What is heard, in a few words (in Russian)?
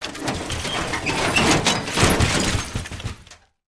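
движение кучи стекла и металла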